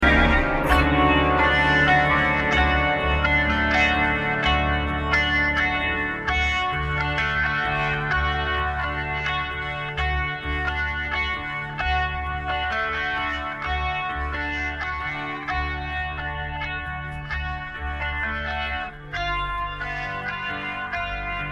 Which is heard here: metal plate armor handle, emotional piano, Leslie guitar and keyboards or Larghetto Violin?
Leslie guitar and keyboards